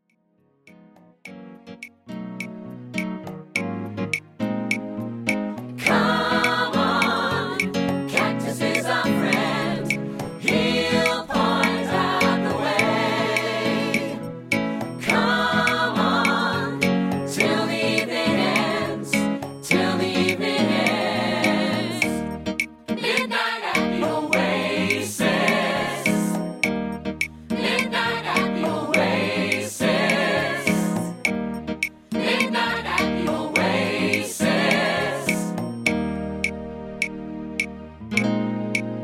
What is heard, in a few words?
Arabian click